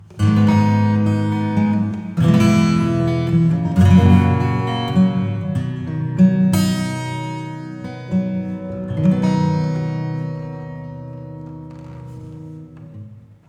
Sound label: acoustic